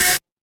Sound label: feedback buzzer